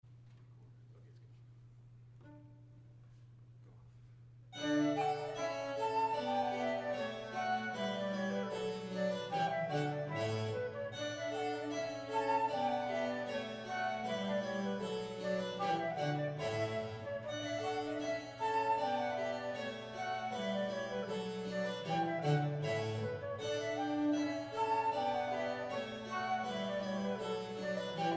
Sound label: Gavotte, harpsichord; Three Men and a harpsichord